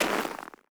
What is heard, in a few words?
Personnel Footsteps Snow; DCS